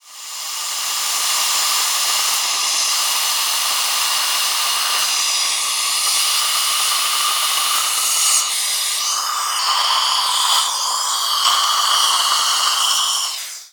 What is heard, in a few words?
dentist vacuum